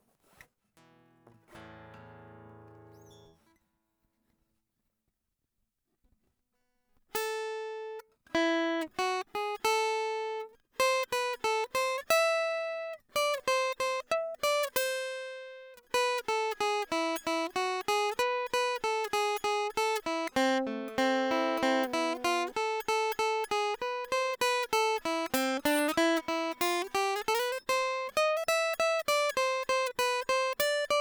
guitar nice

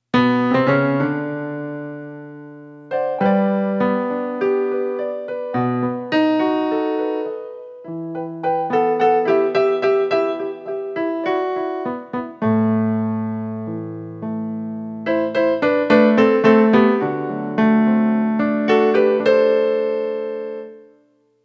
piano

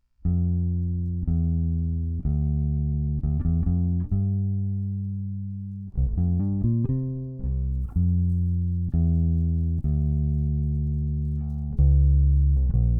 bass

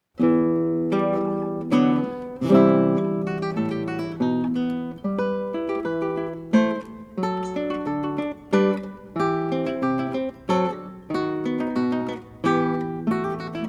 baroque guitar - rondo; purcell

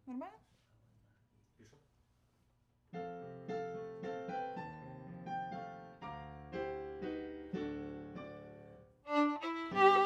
Viola C

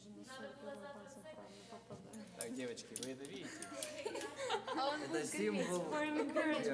Overhead

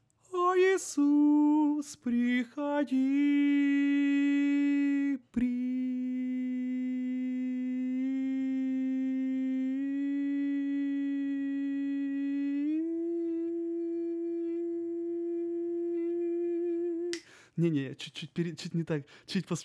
tenor

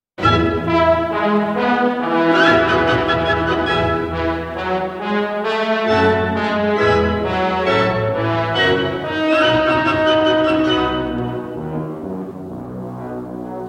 Trombone and Tuba; Britten Young Person s Guide to the Orchestra (extract)

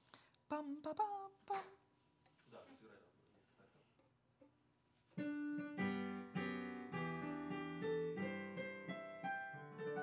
Viola D

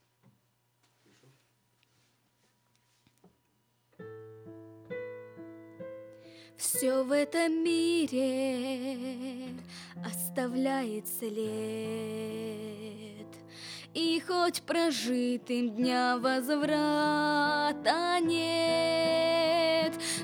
vocal

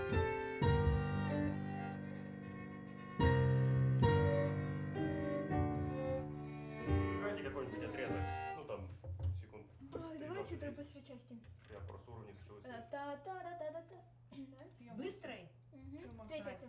Flute

